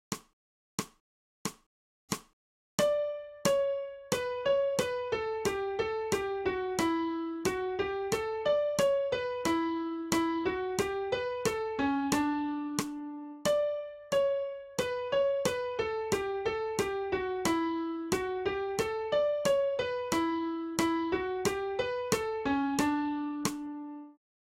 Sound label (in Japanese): 新フレットレス